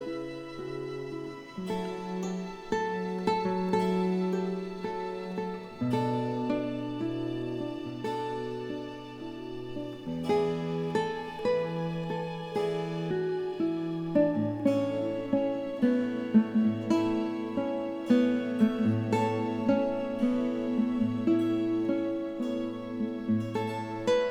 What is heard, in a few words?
Guitar Concerto in D Major; Vivaldi